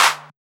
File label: Old Skool House Clap